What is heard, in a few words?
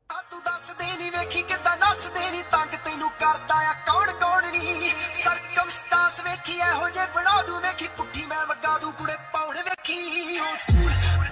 Punjabi Ringtone